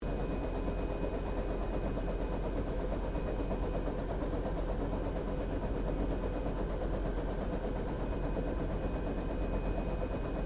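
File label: helicopter Bell UH1 Iroquois idle long interior; RAN UH-1 Iroguios; Helicopters (Sound Effects:Vehicles)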